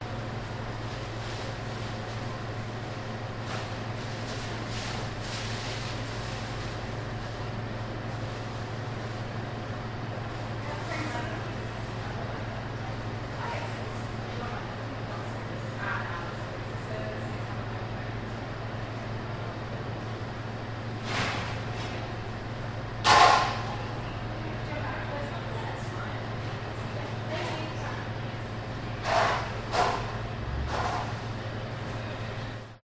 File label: public places sandwich shop one customer short; subway